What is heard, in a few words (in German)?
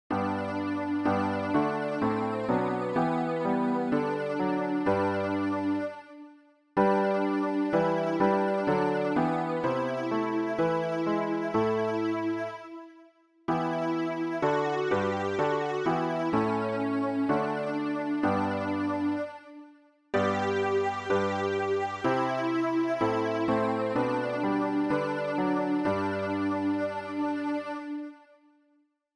alt, Übehilfen für das Erlernen von Liedern; ref gesangbuch; rg 244 brunn alles heils dich ehren wir